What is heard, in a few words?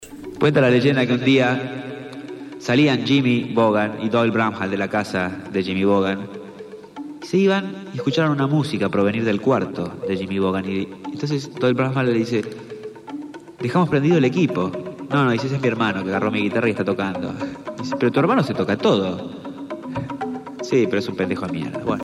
Blues